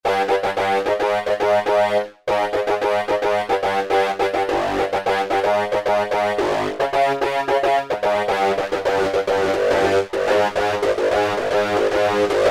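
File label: Lead Grunge, E-mu Morpheus; Factory Presets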